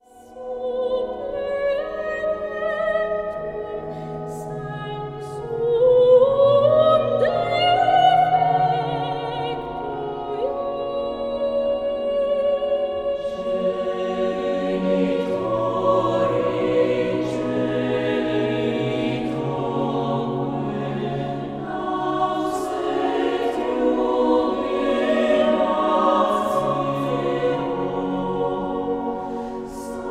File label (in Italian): voci